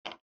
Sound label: type; zara